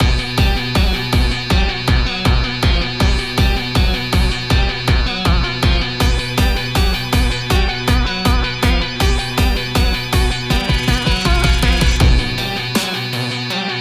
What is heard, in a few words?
Instrumental